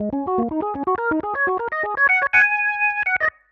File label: ORGN 123, Keys; Houseworx